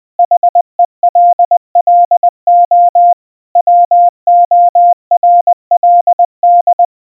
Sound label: hello world, morse; Классическое тестовое сообщение; Генератор азбуки Морзе